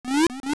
Swim; Link swimming; Zelda

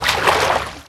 Swim; Link swimming; Zelda

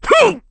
Sumo Slap

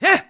Shield Attack; Link

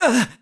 Hurt; Link getting hurt; zelda sounds; Skyward Sword

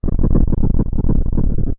Quake; Zelda; LTTP

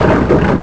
Barrel Turn; Turning the giant barrel

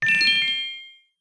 Get Rupee Yellow; Pick up a yellow rupee (Recorded)

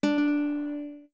Guitar D2; Zora guitar

